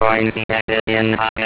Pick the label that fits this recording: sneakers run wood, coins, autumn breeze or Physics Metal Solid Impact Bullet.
coins